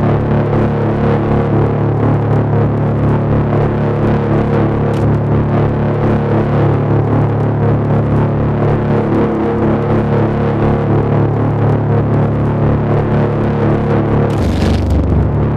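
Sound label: blue turbine loop distant; Titanfall 2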